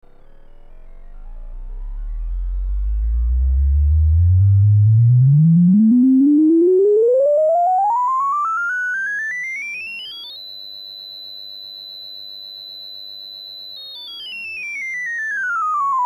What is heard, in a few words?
synth pitch chords